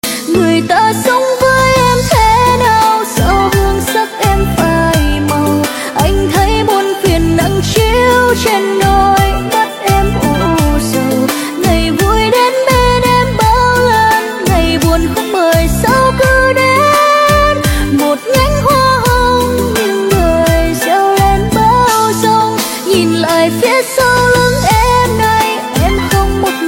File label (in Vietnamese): nhac chuong rumba